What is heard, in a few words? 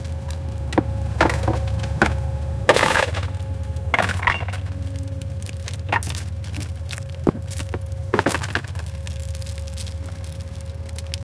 excavator digging through, metal clatter